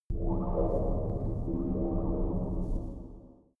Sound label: space biomedical engine room